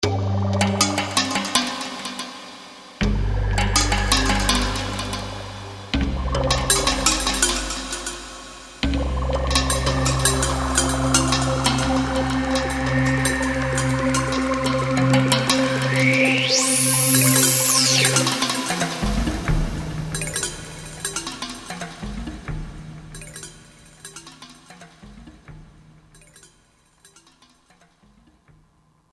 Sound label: Roland JD-990; Factory Patches examples; Armadillo